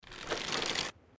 natiajen tetivy arbaleta

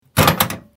yashik s instrumentami

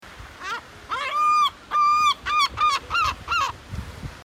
chaika, zvuki chaek

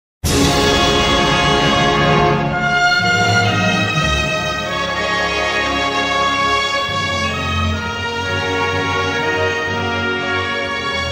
gimn tatarstana instr

